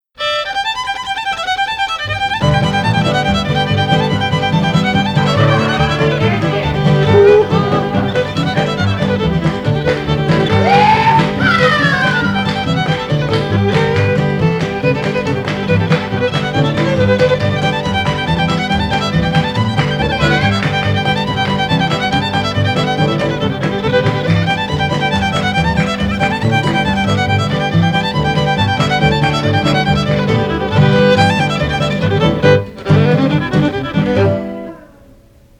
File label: Fiddle Tune